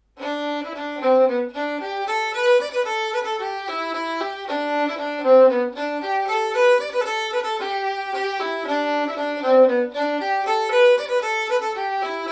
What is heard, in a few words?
Fiddle; Siege Of Ennis